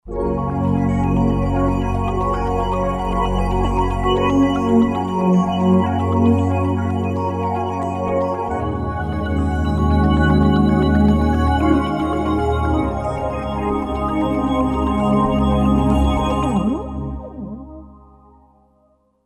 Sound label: Strobe Pad, Roland JV-2080